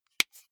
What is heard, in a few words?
marker close lid